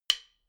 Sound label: drumstick hit hard, percussion